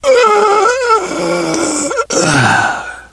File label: death scream, biker, survivor voice